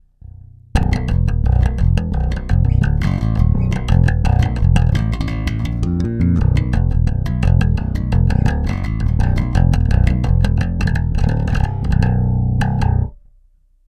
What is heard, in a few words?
Both Death Metal